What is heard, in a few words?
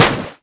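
water slap, Splash; Splashdown; XBOX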